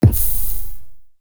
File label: hit sand; GTLive